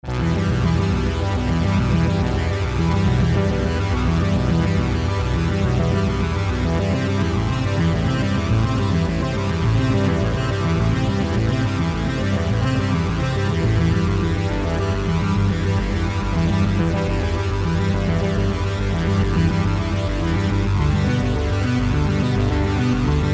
ARP Loop

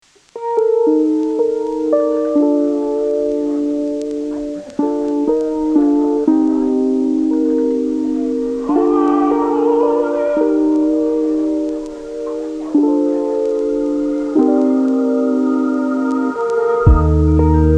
Lofi

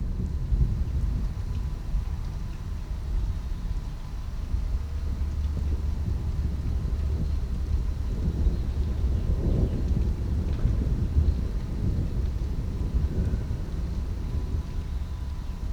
Healing Rain Sound - Severe Thunderstorm

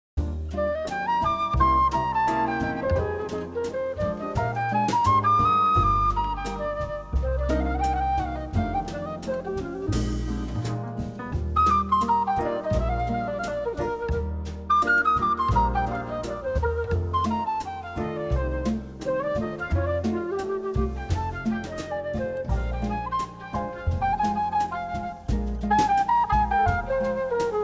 Bossa Nova

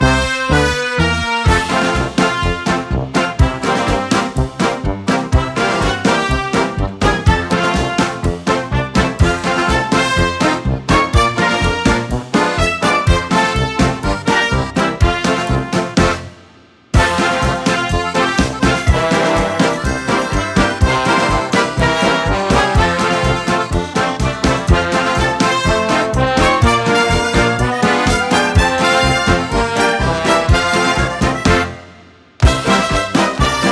Funny Brass Band; Tunetank

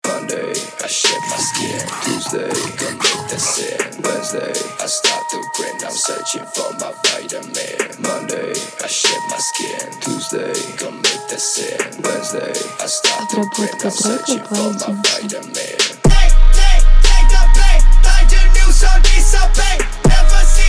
26-29 Hz LowBass

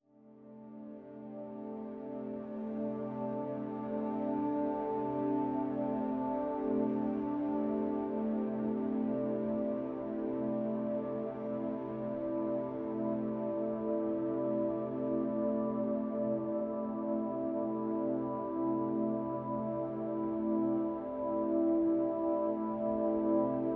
Flowing Chords

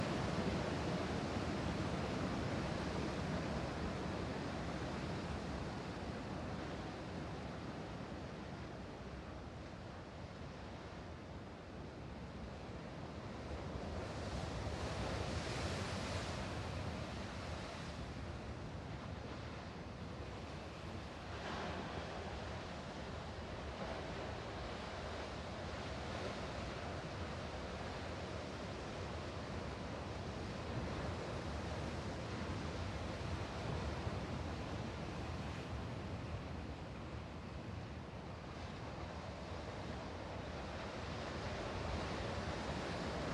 Slow Waves

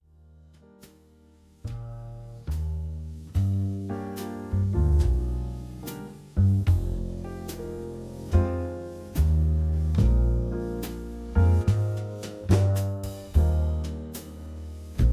Saxophone House Club

